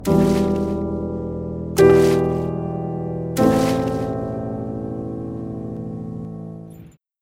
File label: Ambience - Piano; Just Shapes & Beats